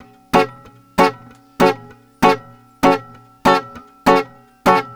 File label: GUITAR, Balkanish Traditions